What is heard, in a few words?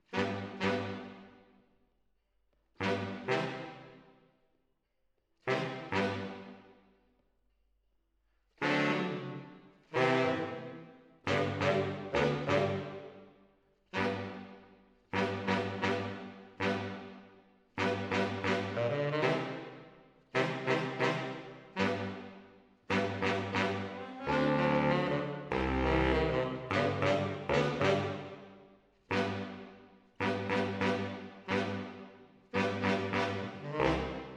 MKH AB, piano